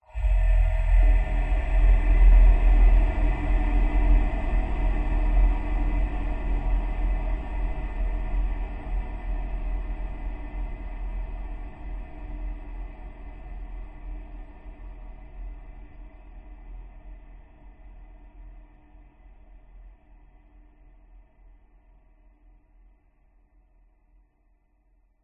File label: horror hit long decay dark eerie airy desolate; zapsplat